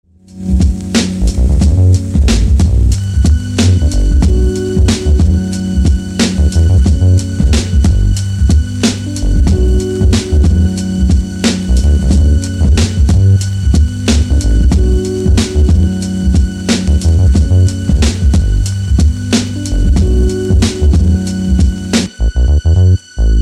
Instrumental

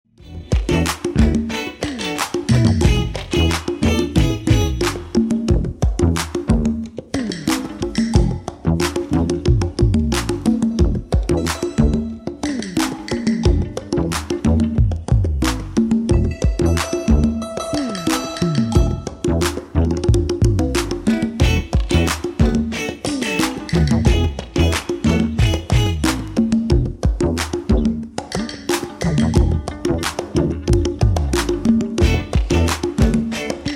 beat

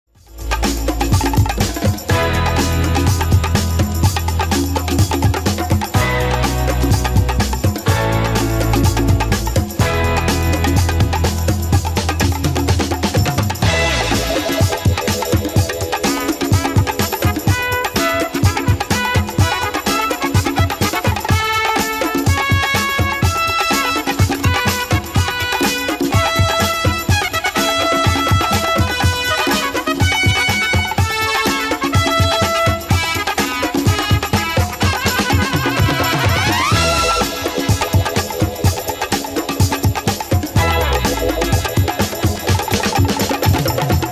bongo

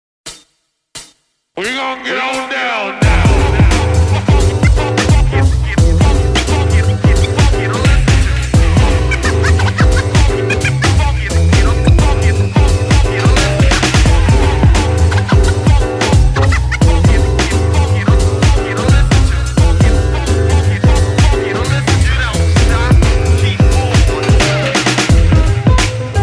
Instrumental

